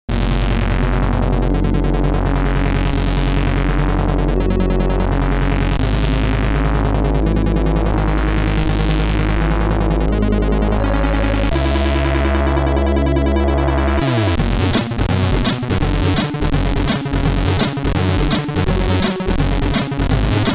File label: Boss Theme 2 (SID Stereo); hermetic; commodore 64